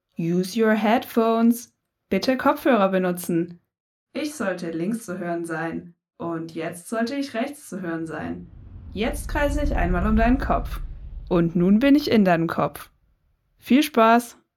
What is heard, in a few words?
Jingle